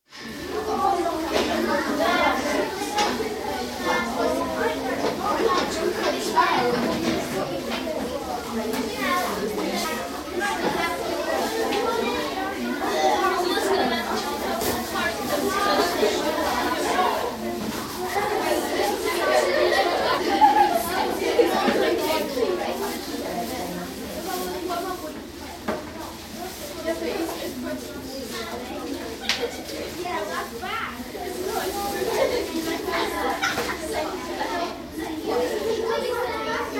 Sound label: Comprehensive School, girls changing room with 20-30 3rd Year Pupils, general chatter; School Sound Effects; BBC